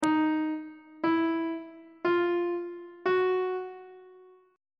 d sharp 2nd interval pitches on treble clef

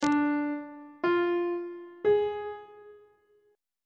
d locrian chord 1 is d diminished; on treble clef

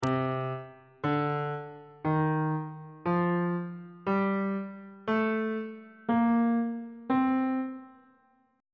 b sharp dorian mode note positions

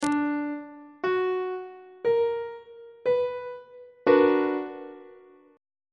c flat minor major 7th 1st inversion; on treble clef

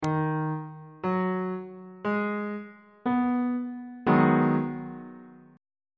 a double sharp major 6th 1st inversion; bass clef

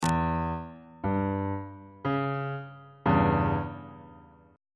c sharp diminished 1st inversion on bass clef

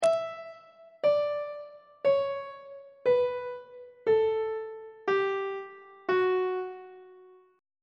f sharp phrygian mode descending on treble clef

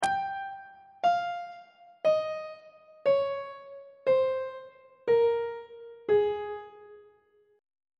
a flat major scale descending on treble clef

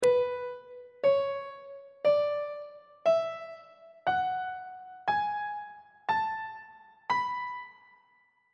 b dorian mode; on treble clef

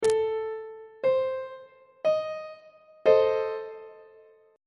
a diminished chord; treble clef

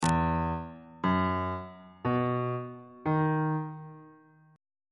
f flat major 7th suspended 2nd chord intervals

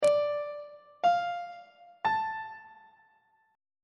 e sharp major chord 6 is c double sharp minor; on treble clef

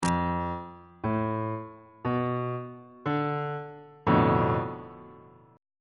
b half diminished 7th 2nd inversion on bass clef

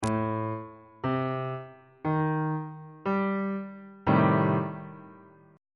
c minor 6th 3rd inversion on bass clef

